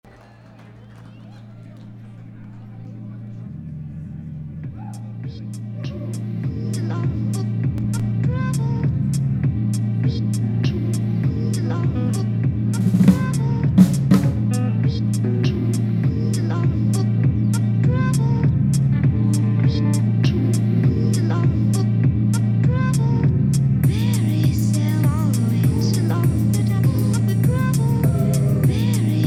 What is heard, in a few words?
Live Broadcast Mix; Live at Froggy Daze; WKZE